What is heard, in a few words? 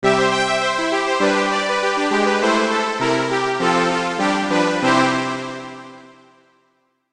Classic Str Machine Violin, string machines; electrickeys